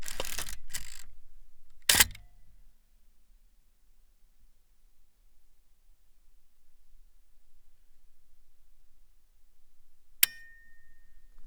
OBTURATEUR 8s MAMIYA RZ67

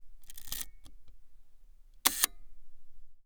OBTURATEUR 1/10s

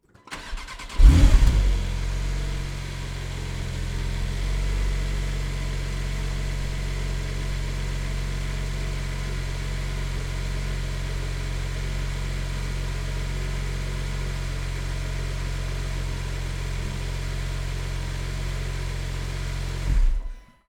DEMARRAGE

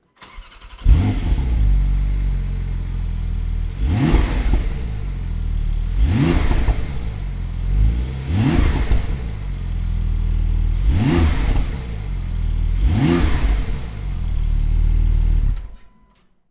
ACCELERATIONS

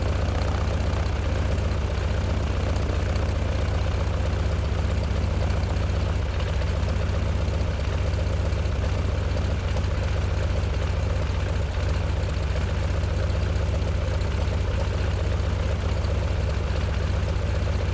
RALENTI SIDE

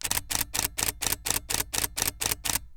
RAFALE

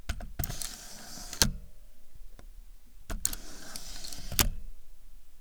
ROTATION DOS MAMIYA RZ67

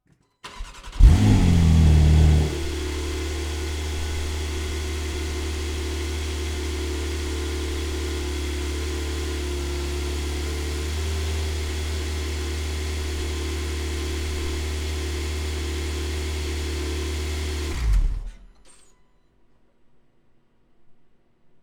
DEMARRAGE MODE SPORT PLUS

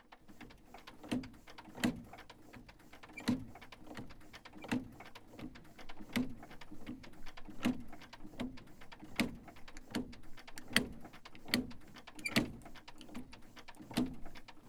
MANIVELLE FACE

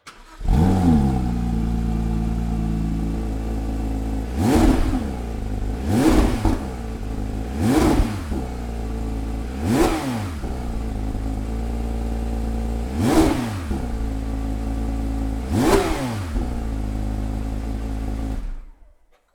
ACCELERATIONS

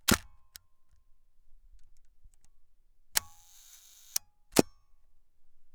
RELEVE MIROIR PAUSE LONGUE

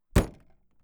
FERMETURE PORTE

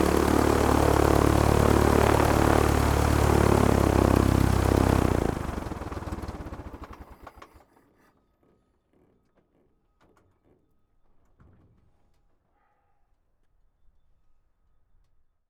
EXTINCTION MOTEUR

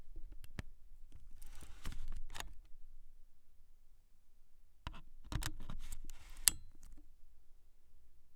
RETRAIT MISE EN PLACE OBJECTIF

